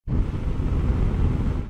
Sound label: flamethrower shot